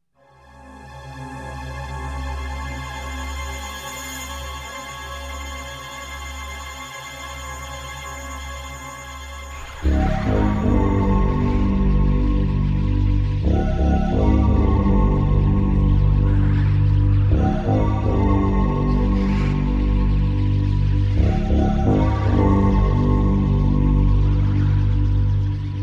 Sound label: Music; Dungeon Master II; PC-9821